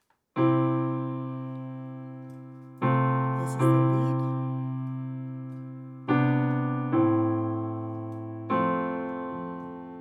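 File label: Full Choir Soprano Lead; summer choir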